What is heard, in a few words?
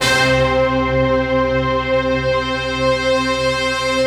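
staccato orchestra, C4; Best Service; Advanced Media Trax Modern Composer; STACCORCH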